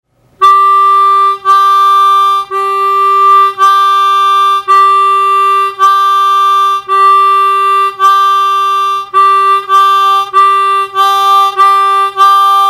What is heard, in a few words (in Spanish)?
comparacion asp sop, armonica; Ej.1-comparacion-2-asp-3-sop